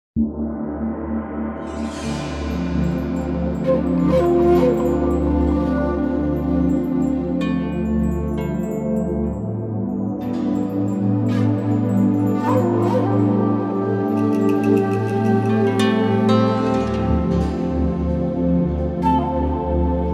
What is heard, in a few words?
zen far east sounds